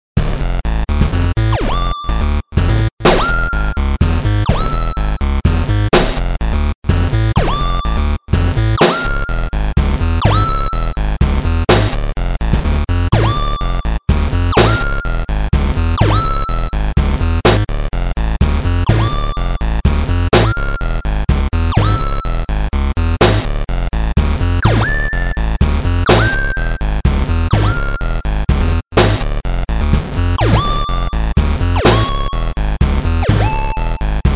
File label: gears